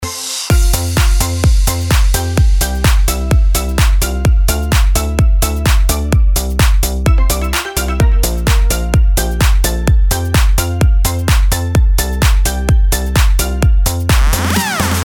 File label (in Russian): Мелодия на звонок